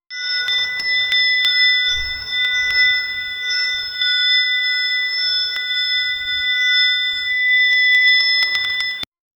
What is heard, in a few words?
intense repetitive ring; brief